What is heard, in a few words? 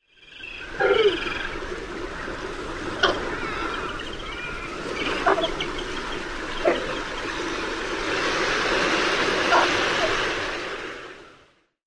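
calls, Pelagic Cormorant; AK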